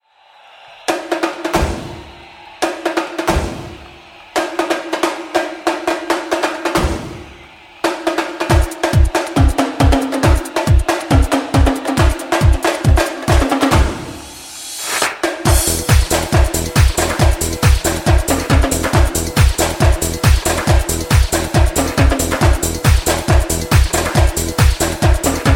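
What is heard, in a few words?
drums tom toms; barabany-tamtamy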